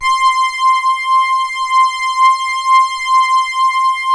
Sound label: C5, ANALO STRING; Sound Gallery - Charged